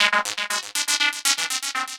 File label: Progressive Synth, Trance